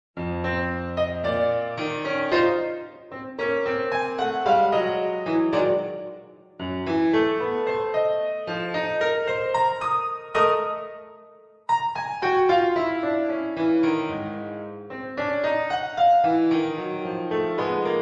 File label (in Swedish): Musik för piano